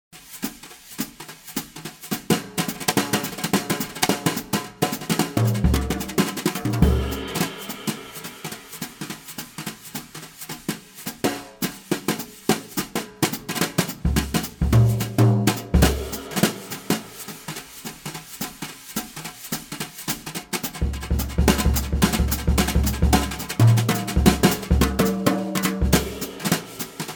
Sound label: drums